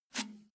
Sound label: bmx bike release bar soft